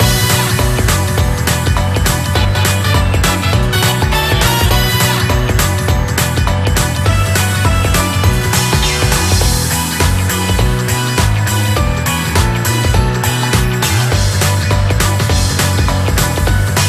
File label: jackpot sad girl bgm